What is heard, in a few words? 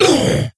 wound crit, creature; Shadron